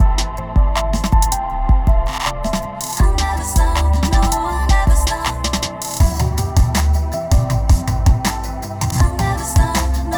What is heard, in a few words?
Absolute 80s, free loops